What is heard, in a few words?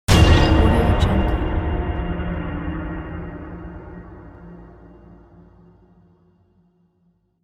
horror hit